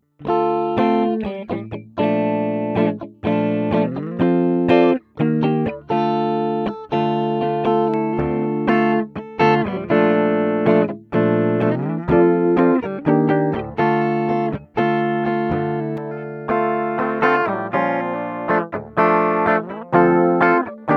direct

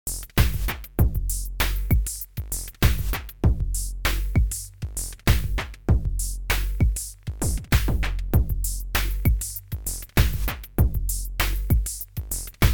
Microtonic Pattern; Sonic Charge

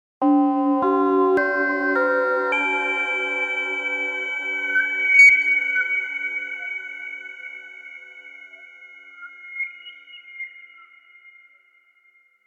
Korg Prophecy VST Comb Waterphone

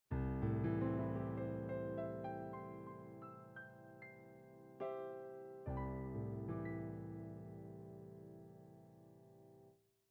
MOTU M6 Line IN Korg Microstation

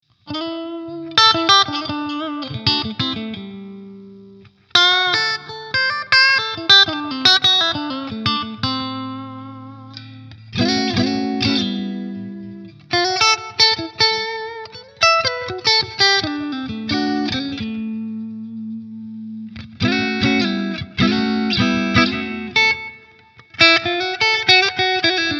Clean

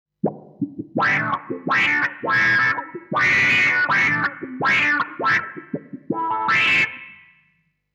Auto Wah

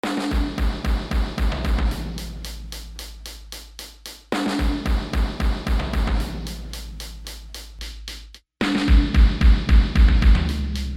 Drums

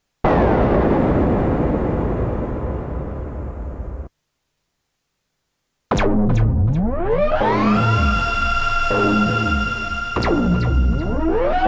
Korg Prologue

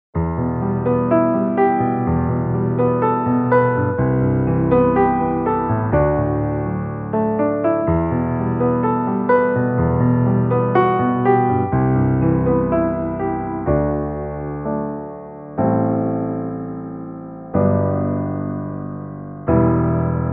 Electro 6 Velvet Grand

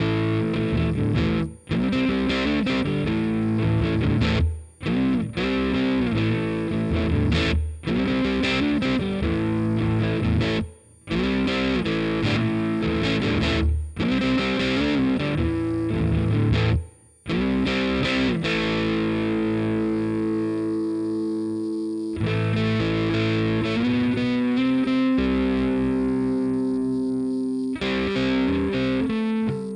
Industrialectric 4046 m Bitcrusher without VCO

